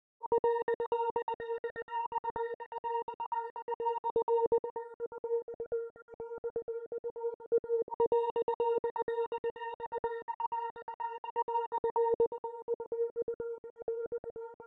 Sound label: 125 BPM A# Min Synth Lead; Melody Loops; Loop Stems; Cymatics; Antidote